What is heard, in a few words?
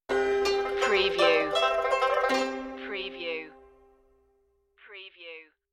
chinese waltz stinger